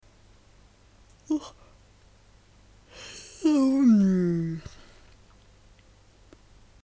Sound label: yawning twice